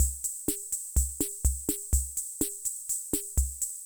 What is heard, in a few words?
Loop, classic machines; arranger